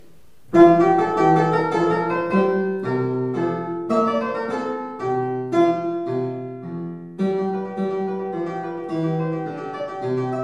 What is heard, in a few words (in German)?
Menuet F Hammerfluegel; mozart